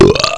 Buurp; Nuclear testing

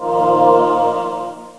item find; Ferazel's Wand Demo

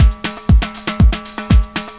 breakbeat; World of Sound; instruments; beats

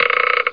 string; attic

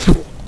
Killed By Door; ClockWerx Demo

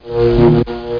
Electrical Buzzer; AddamsFamilyInt

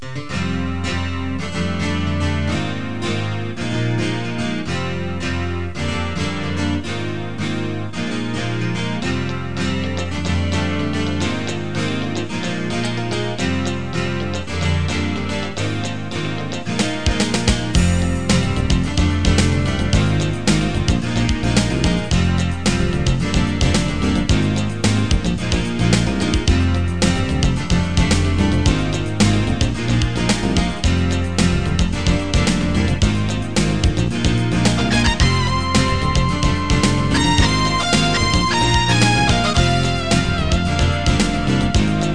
rock grunge; MIDI Master Collection 2

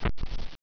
Scrape; F/A-18 Hornet 2.0

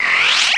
ZIP; Mission To McDonaldLand

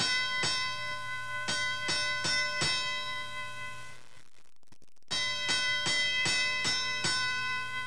fire alar, snd eff

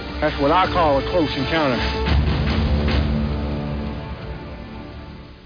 close long; independenceday-movie

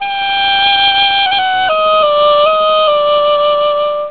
Snake Charm; Realmz 6.0.3; The Family Jewels

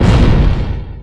Player Ship Hull Hit; Retro Gamer 17; 2D Shooters; galacticgladiator

